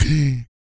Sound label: BIG BREATH